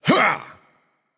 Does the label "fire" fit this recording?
No